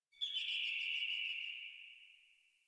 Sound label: Tree Distant Critters, Ambience; Props; Warframe; Duviri